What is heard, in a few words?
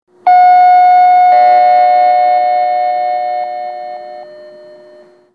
chime; polestar2